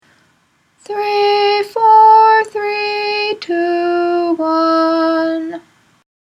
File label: weekday ahava rabba closing, nusach